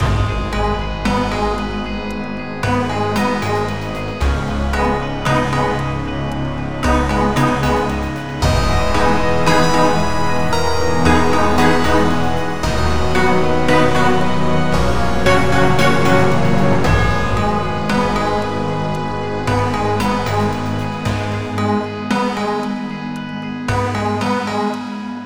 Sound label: Cinematic Drama; Jankbrain; PS4; Windows